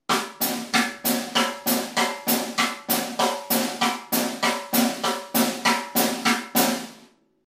jungle cross sticks, batteur

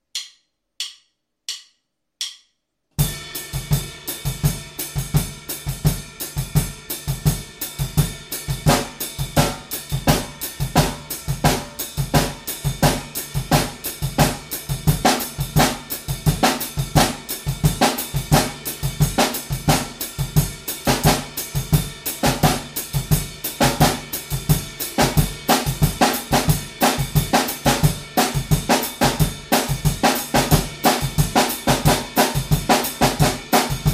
samba batterie cymbale

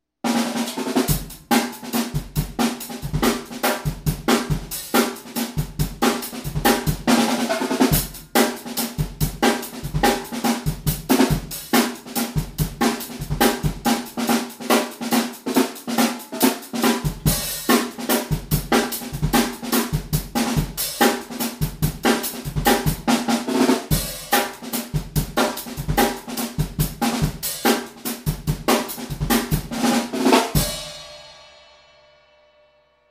jungle loop exemple pitch

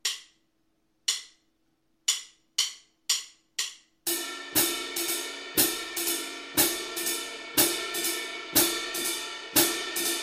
swing serré

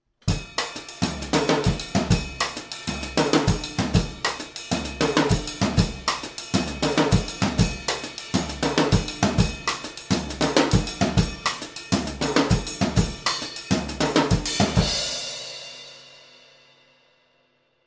rythmes incontournable, batteur

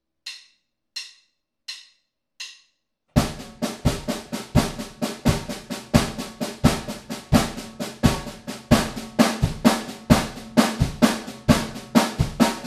triolet

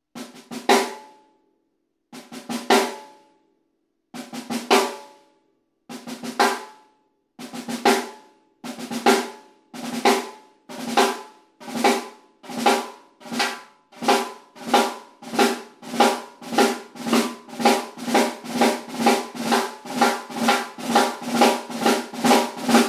exemple ralentit

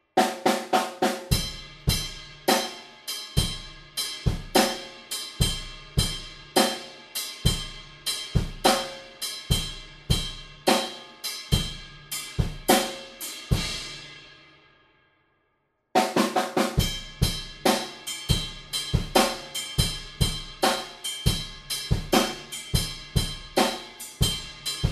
rythme base

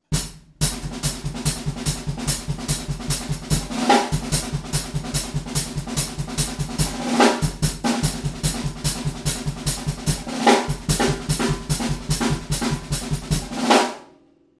jungle loop grosse caisse

